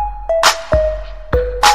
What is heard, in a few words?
hip hop sms